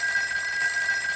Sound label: telephon ring